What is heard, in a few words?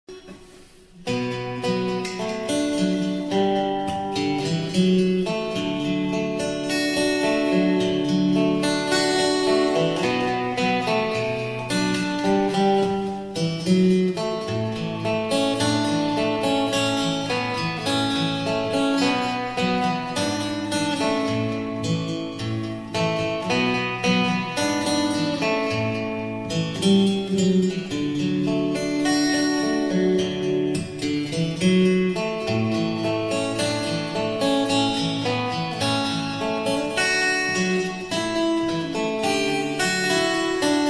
fingerstyle, guitar